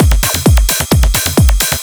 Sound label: 131 BPM, Drum Loops; Zero-G; Club Techno; Drum Set